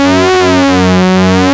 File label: SYNCSWEEP F#, ARP Synths; E-MU Formula 4000 Series Vol. 3; Analog Odyssey; ARP Synths II